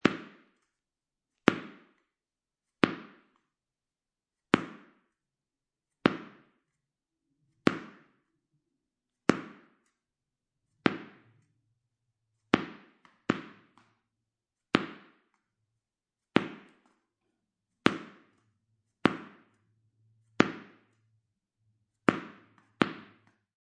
Foley, Ball, Tennis Ball Bounces On Concrete, Many Bounces